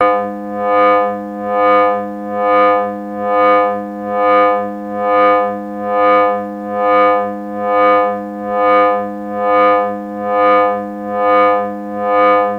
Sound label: Scanner, scanners